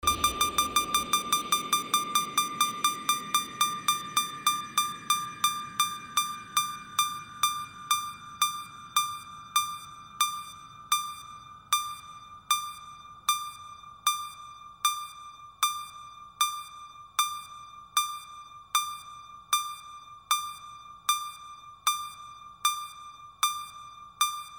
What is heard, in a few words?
hard house